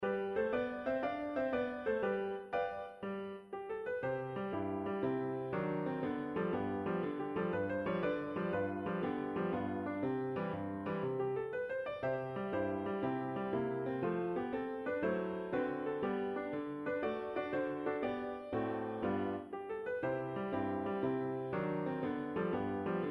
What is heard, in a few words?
trombones